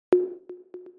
122 BPM Toms, percussion; MMB; Percussion Essentials

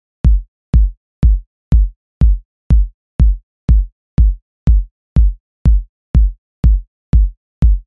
122 BPM Kicks Step 3; mmb drum arsenal vol 1

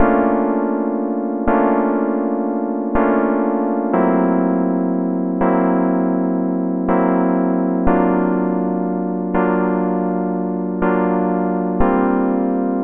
122 BPM Tonic D Fmaj Dmin Chord Progress; mmb chord progressions essentials

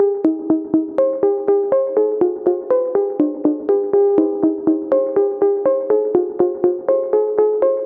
122 BPM Song Starters D maj C min Tonic G; mmb studio soundtrack essentials

